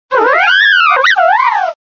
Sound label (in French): Cri; 1,9 s